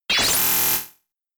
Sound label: Charge Beam; Charge Beam part 2